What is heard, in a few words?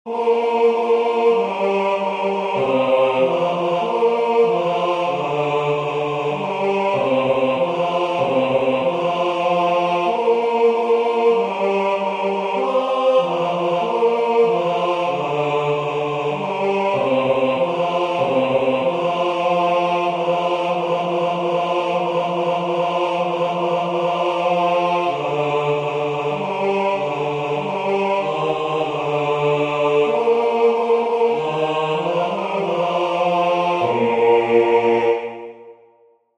bass; Waiheke Island Choral Society